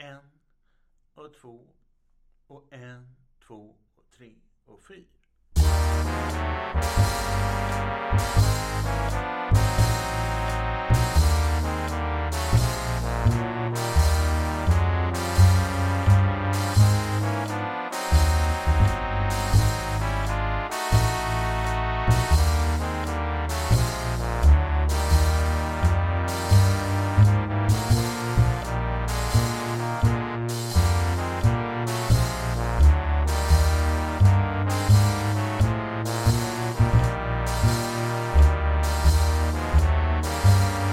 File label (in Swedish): 3 tromboner Eb 86 bpm med bas och trummor